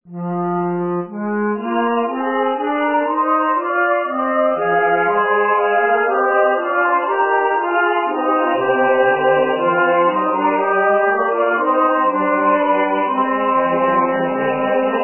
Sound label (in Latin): Rorate Caeli Eb Alto